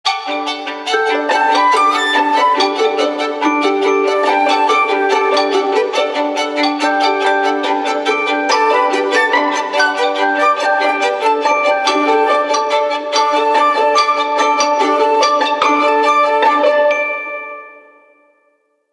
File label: Alarm Forte; Vertu Constellation T